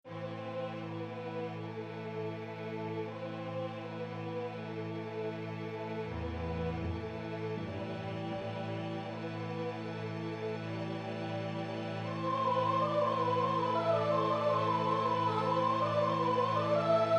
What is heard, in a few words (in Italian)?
base la minore